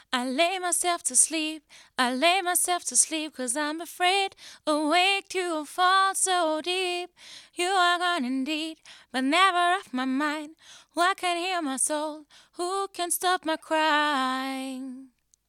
vocals beyerdynamic tgx58

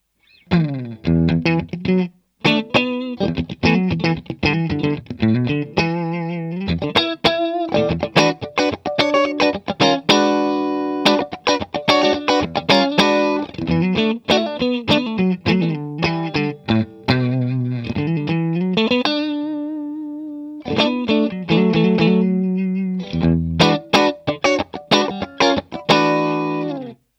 clean md421; vox mv50 ac test

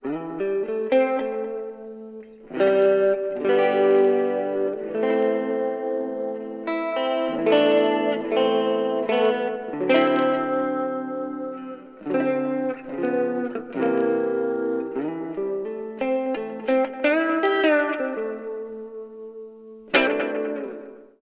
fluid audio strum buddy clean reverb chorus; fluid audio strum buddy test

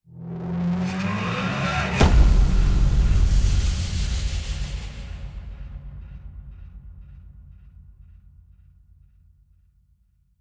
hit; heavyocity gravity

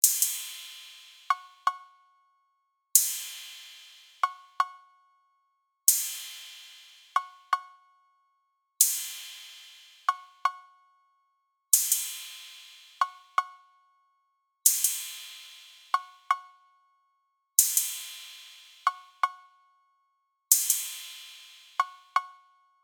Ride Perc; Trap-Beat

